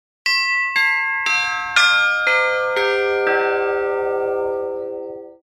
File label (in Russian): 7 kolokolov; Eisk